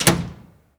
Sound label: metal door close